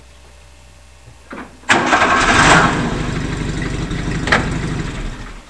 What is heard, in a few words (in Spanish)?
R10 arranca y para